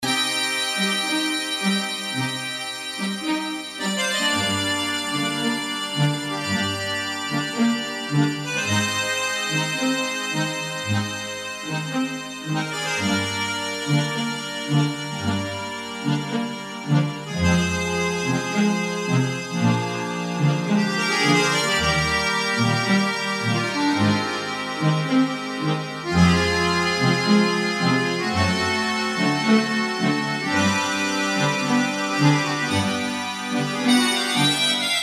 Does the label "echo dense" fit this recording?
No